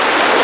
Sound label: wave; Nintendo; July 2020 Gigaleak